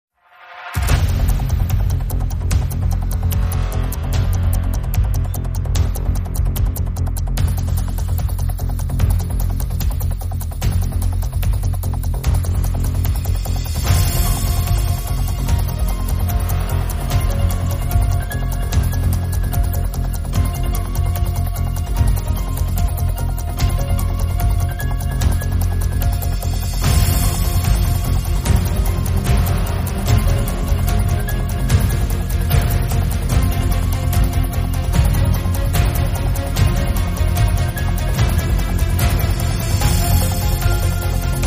Race To Win

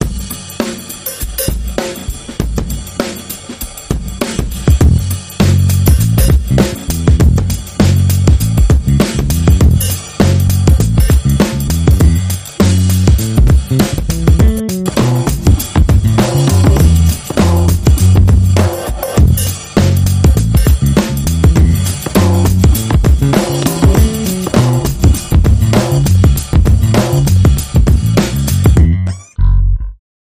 Underscore

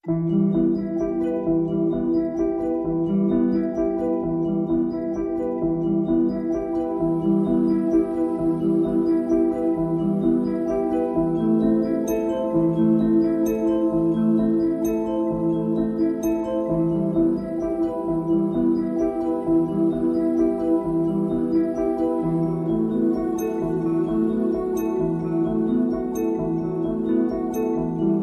Handbells E

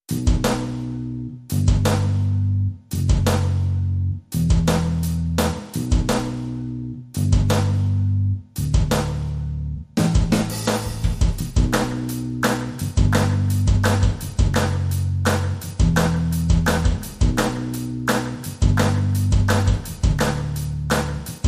Drums Bass Clap

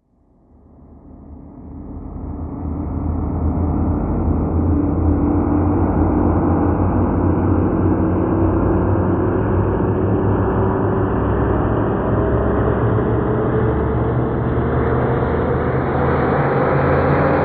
Rise Long; Ozone Wall

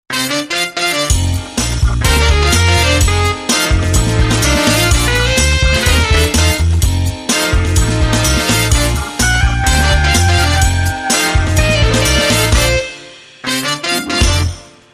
Alt Horns

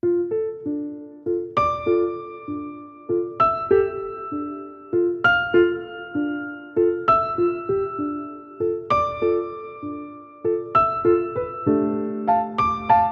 Sentimental Waltz

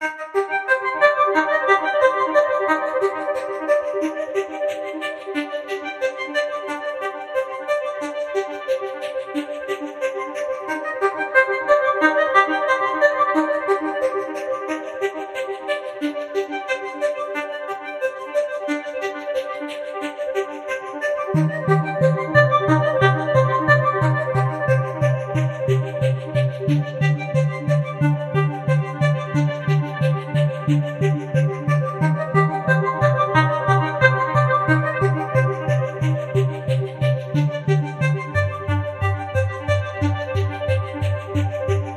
Inquisitive Electro Arp Pulse

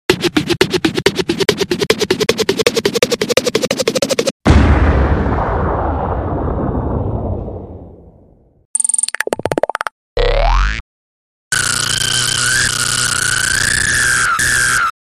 Scratch Chit Chat Rubber Band Circuit Fire

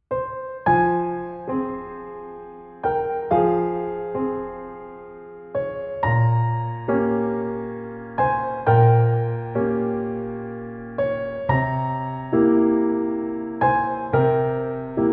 Sad Piano

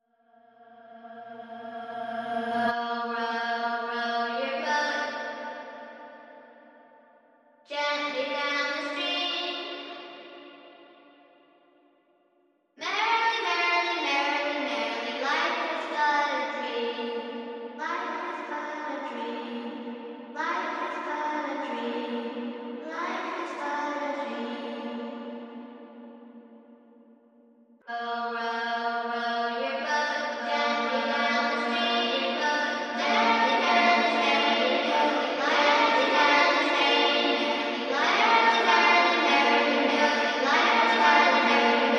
Horror, Vocals Only

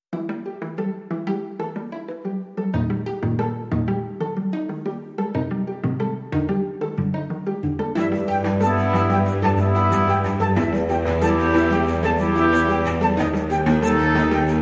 Bubbles

